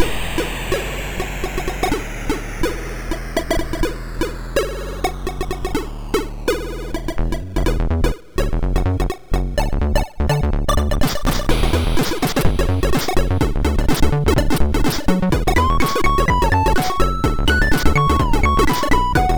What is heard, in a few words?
sid MOS6581R2